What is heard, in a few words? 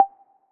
Ingame Count